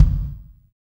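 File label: kick, Roland; Club-50 - Foundations Roland; Ext.Snare 3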